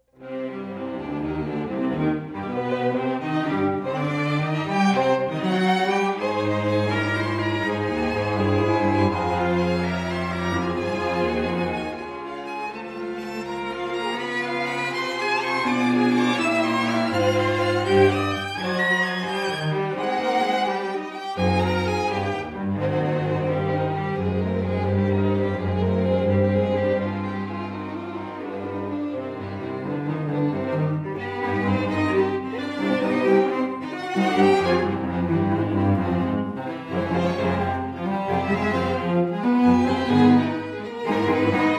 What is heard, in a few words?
sextet; gliere